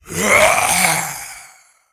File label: Skeleton Enter Play